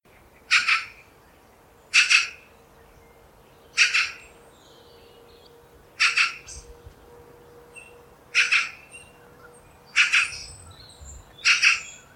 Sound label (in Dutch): ekster roep